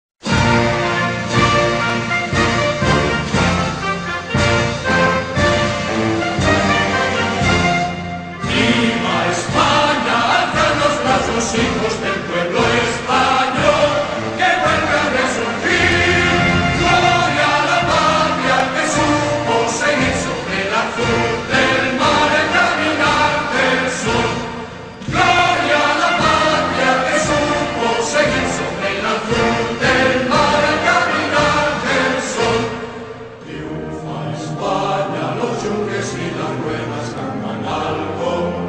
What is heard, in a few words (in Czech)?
narodni hymna spanelska la marcha real